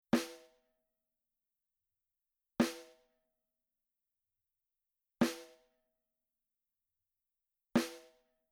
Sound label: SNARE Edge Soft